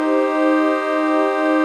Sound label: CHRD PAD; Best Service; XX Large Pads; Sample CDs